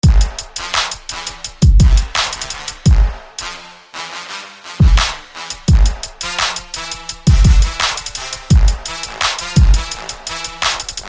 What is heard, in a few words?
Trumbone Stacc